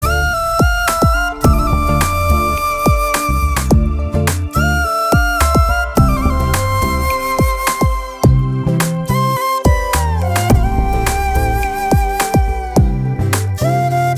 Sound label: Love Bgm Tone